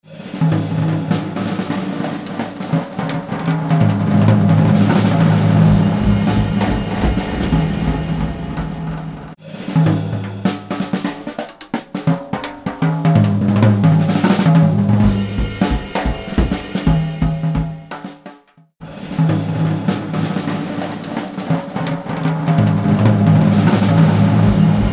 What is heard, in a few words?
Harmonizer Drums Dual Bouncing Ball Kick; H949 Harmonizer